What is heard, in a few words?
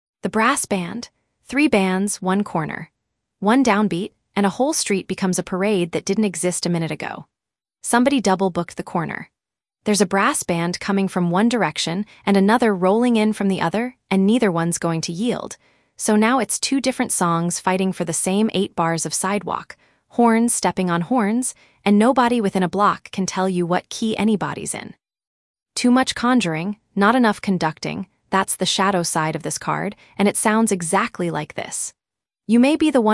brass band reversed; louisiana